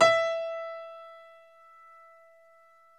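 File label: E2 piano; Northstar - New Gold VOL-2; BOS.GRAND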